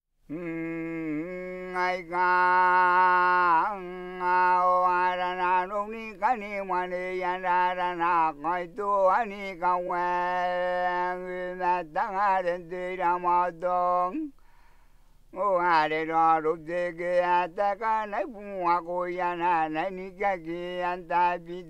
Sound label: Te Katake Chant